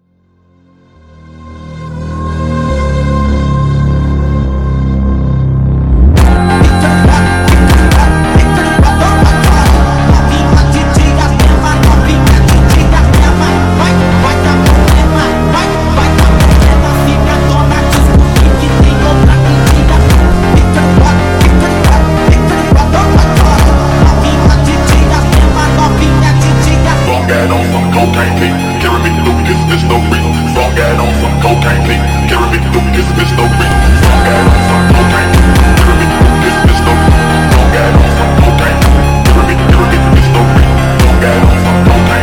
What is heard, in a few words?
Funk speed Up